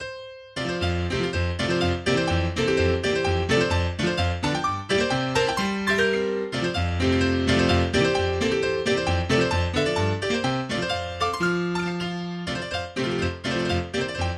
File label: Etude in F Major Allegro; Etude No15; Cartwheel; Opus 25 No3